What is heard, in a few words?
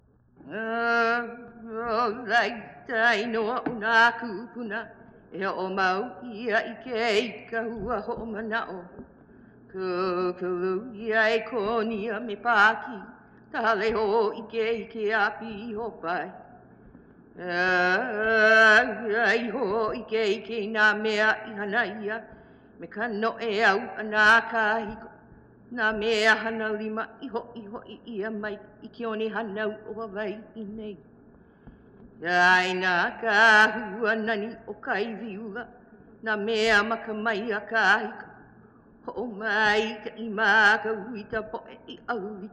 Name chant, Hawai'i; Na Leo Hawai'i Kahiko - Voices of Old Hawai'i; for Bernice Pauahi Bishop; World Music; Hawaiian